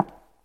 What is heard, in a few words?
tile step; Original TK Sounds